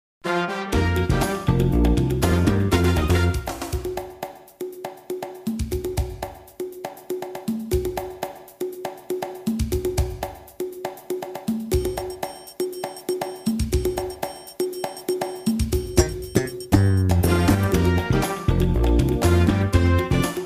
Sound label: game show music bumper